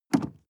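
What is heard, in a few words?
sports car interior pedal gas step medium; verkehr transport; autos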